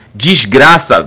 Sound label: berimbau